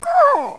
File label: Deflate; Adium